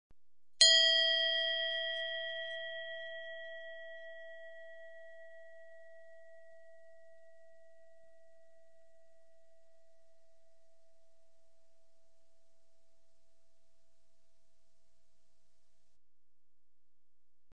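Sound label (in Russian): колокольчик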